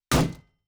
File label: Shield Metal; Fantasy Sounds Bundle; Medieval Combat Sounds